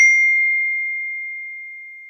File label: phone notification